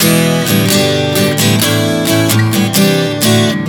E-G-A-C-D 130 bpm, acoustic guitar chord progressions